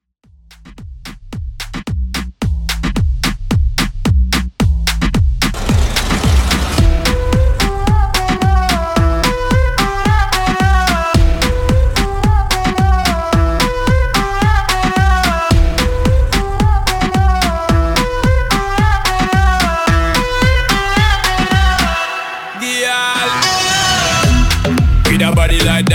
Moombah 110 Bpm

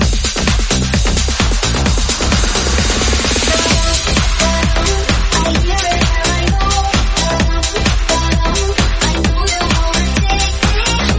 Bass House 130bpm

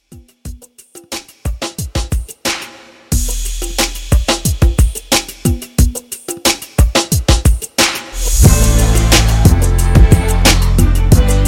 Re Drum 90 CLEAN

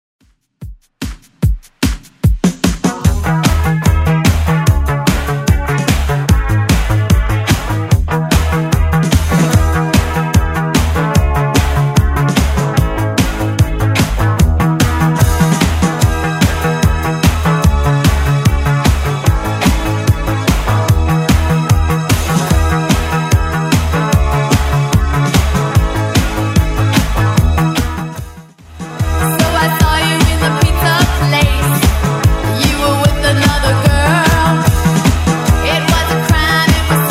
80s Redrum 148bpm

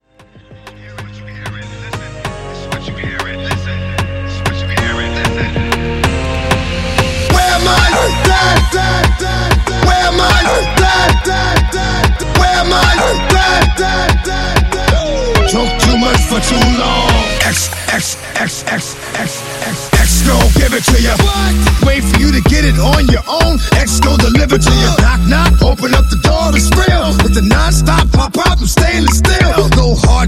Reggaeton Intro; Remixfreakz

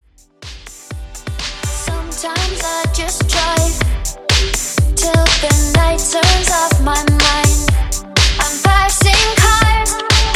Pop Dance; Top40

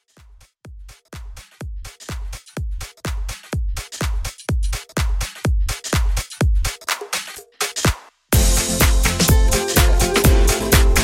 Pop House Club Mix 125 BPM Clean

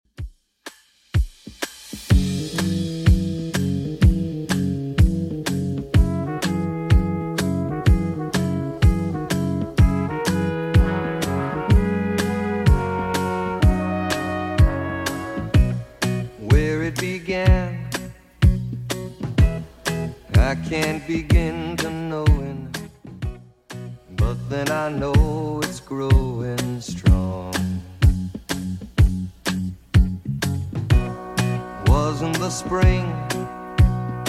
60s Redrum 125bpm

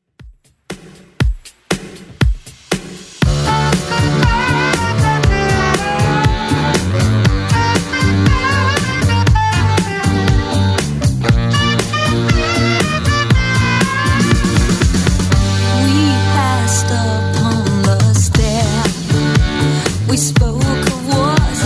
70s Redrum 119bpm

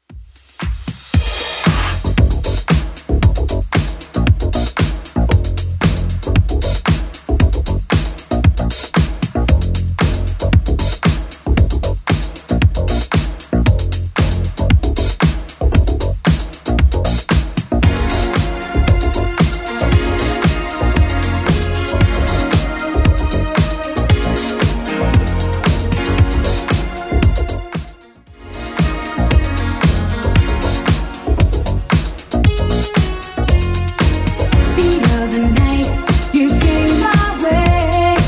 80s Club 115bpm; Club Edit

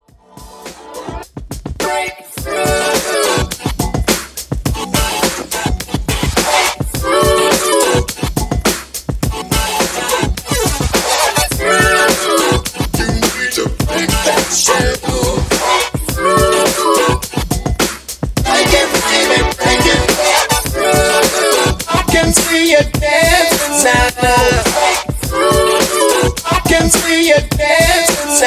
Top40s Re Drum 105 BPM

